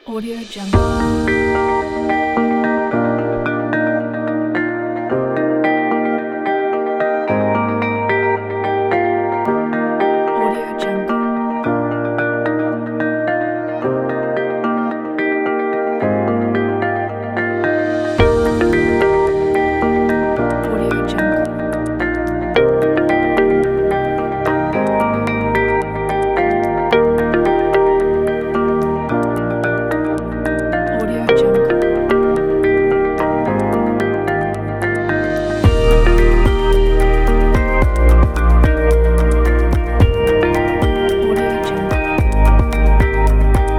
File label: sentimental inspiring corporate; cozy vibes